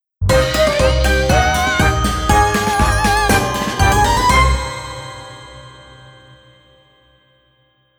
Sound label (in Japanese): ハロウィンシンクル; ハロウィン系ジングル; アコースティック, シネマチック